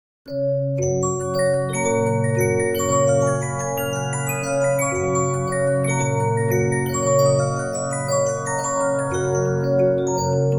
Regina, musicbox, 15inch; disc musicbox

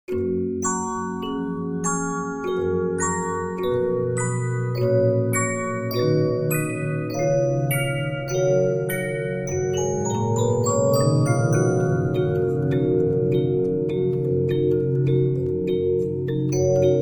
Regina Song, musicbox, 15inch; disc musicbox

